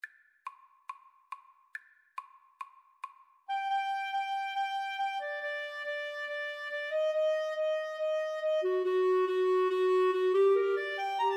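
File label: mozart symphony 25 3 clarinet karaoke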